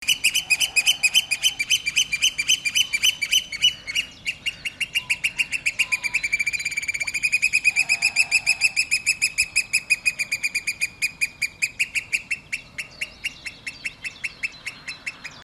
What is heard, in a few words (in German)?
Stimme des Austernfischers